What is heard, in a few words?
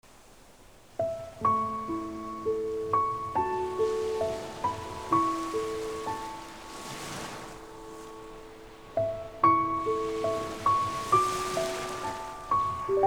whispers in the waves